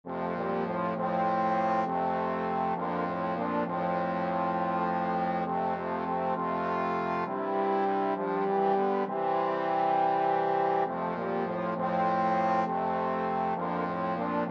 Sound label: blessed assurance, trombone quartet